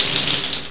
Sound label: SQUIRT